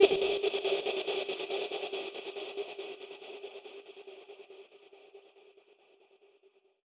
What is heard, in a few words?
Vocal FX 140BPM; G minor; Sound FX; Vengeance Samples